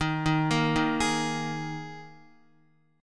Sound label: Eb Suspended 4th Eb Guitar 4F 4A 5C 5G 5C 6E; Ebsus4 arpeggiated; JGuitar